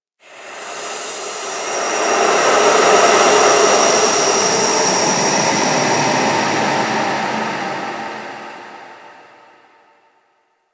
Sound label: Whisper D; Ambience; Interior; Warframe; Orokin